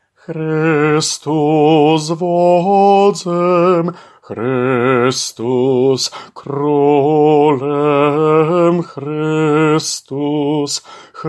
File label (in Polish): Chrystus Wodzem Alt